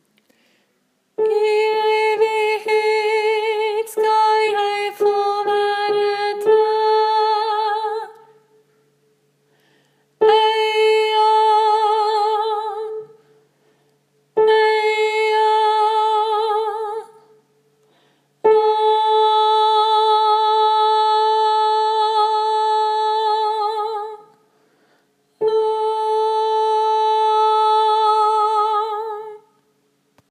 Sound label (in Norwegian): tenor; o-jesus-du-som-fyller; åfjord